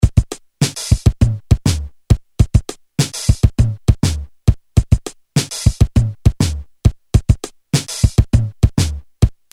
Fonky, Korg DDM-110

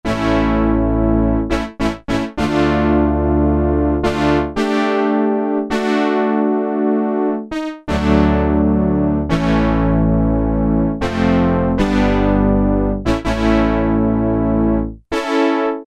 Korg Poly-800; Synthenelli

